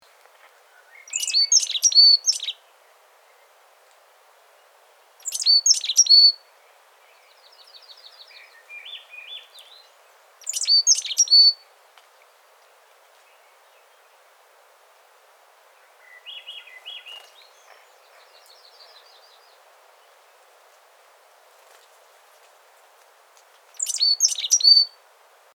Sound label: Boreal Chickadee, Poecile hudsonicus; chickadee nuthatch titmice